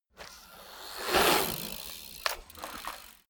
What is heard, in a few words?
bmx maneuver ramp land